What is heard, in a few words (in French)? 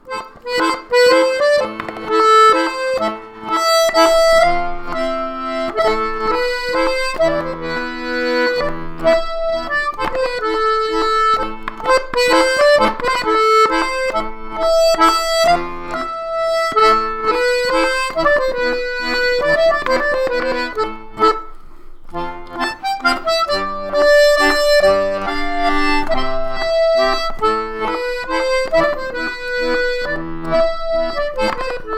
Mazurka italienne; Grand Orphéon